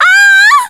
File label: screaming; amy rose